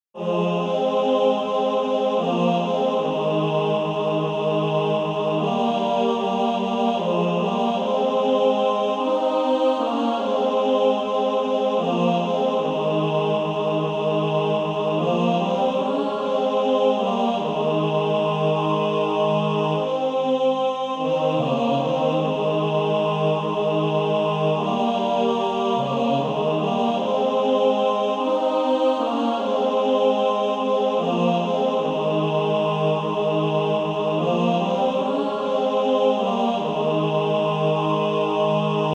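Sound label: Male Choir, tenor bass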